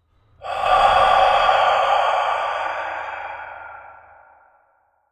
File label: More Masculine Ghost Sigh